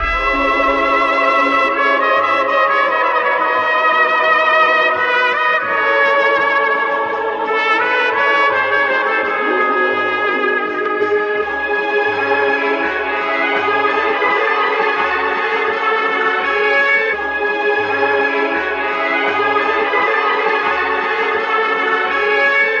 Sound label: horns mexican, phonky loops